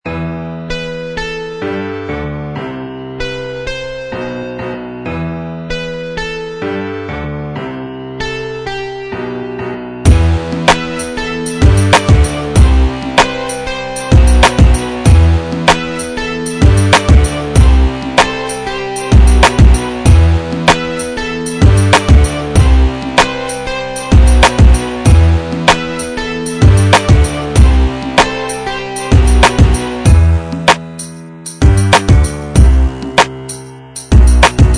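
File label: guitar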